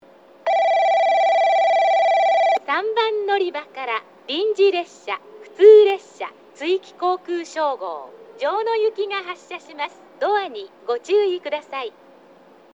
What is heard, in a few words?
Tsuiki Yukuhashi local Jyono; 行橋駅 発車放送（城野行き）